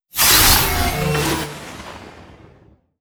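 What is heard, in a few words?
grenade explosion digital; weapons; Half Life Alyx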